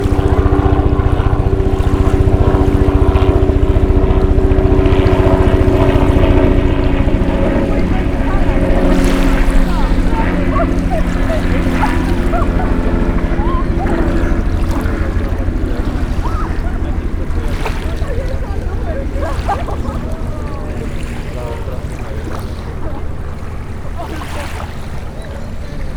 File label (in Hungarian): regi borton sziget strand tavozo helikopter